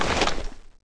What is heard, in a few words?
ammo gun draw, weapons